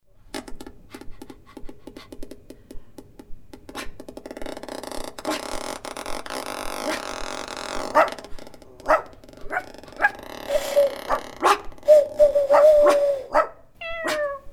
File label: Animal Imitations Soundscape; IBAC